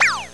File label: menu away; GAMECUBE; SUPERMARIOSUNSHINE